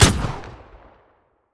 silence

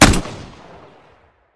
shoot; EMXArms; mg90

